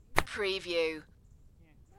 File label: Golf Shot